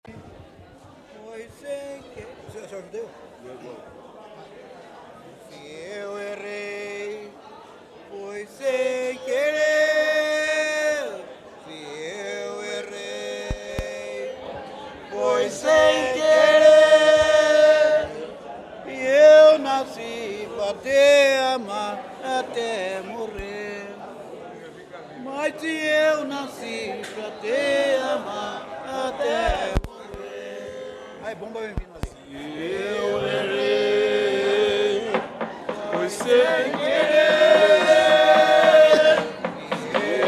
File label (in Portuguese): batuque de umbigada tiete se eu errei